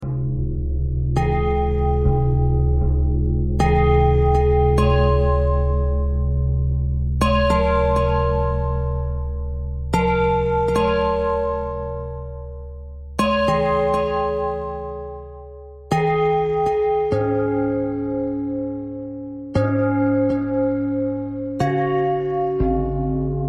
bell thread pentatonic

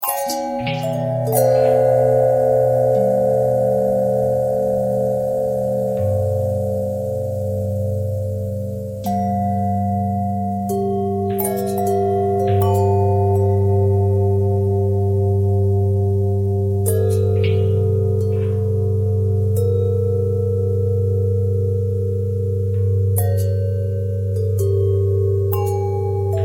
bell long bell harmonic twist

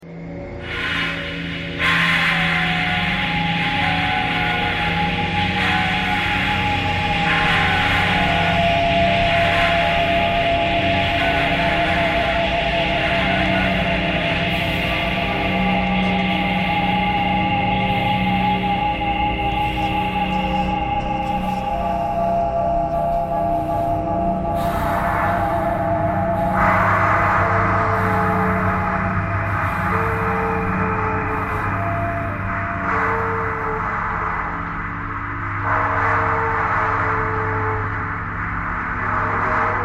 thread pentatonic

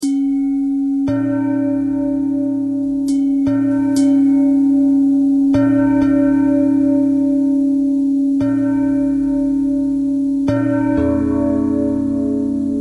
bell bell thread noise

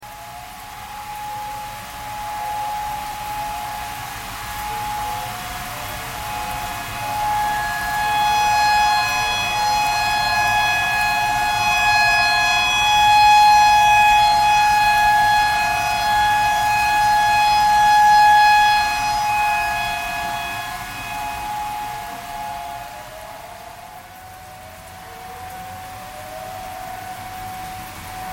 tornado siren tornado siren thread pentatonic

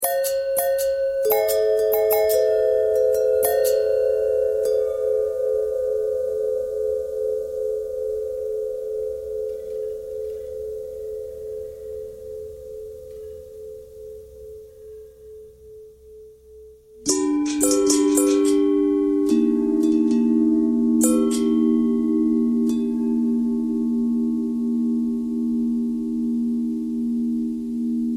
long bell thread noise; bells

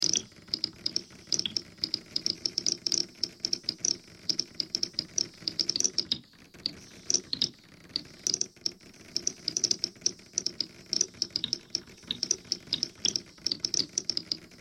bagpipe thread simple buzz

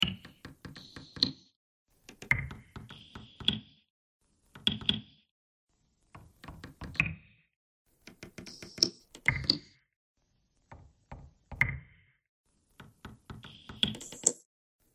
bagpipe thread noise

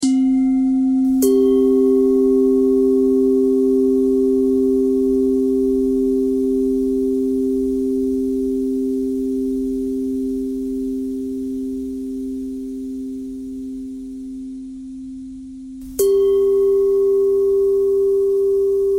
bell thread pentatonic

